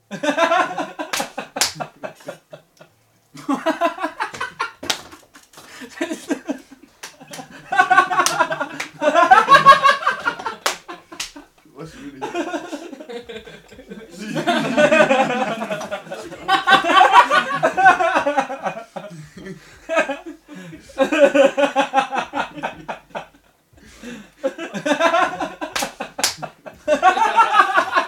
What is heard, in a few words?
laughing compilation